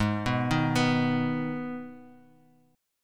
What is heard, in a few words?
G sharp Minor G sharp, chord